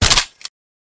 m4a1

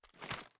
inspect, mp7

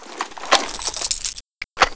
saw reload; czero opforce

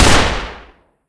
fiveseven; czero opforce

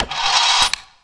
grapple reset, weapons; quake2; openffa

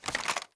clip release, items

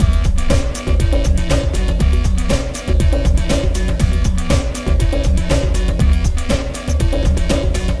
snapper beat; kopovcasino

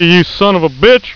sob; valve

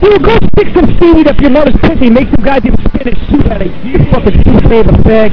pickup

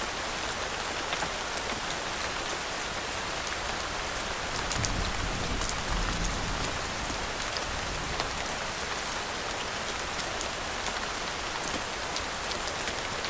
ambience; cstrike; de beishan

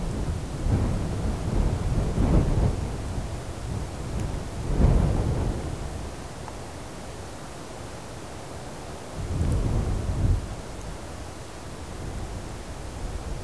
wind lite gust; cstrike retro

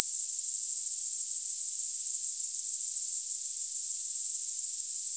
crickets; de tabocas

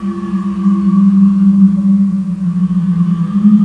field, ambience; de tabocas

